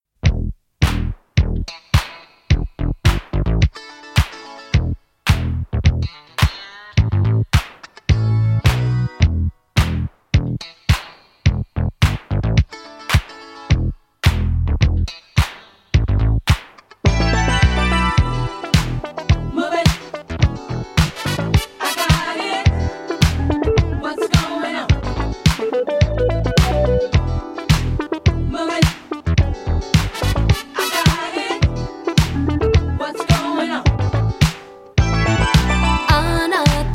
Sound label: Female J Pop Boogie Funk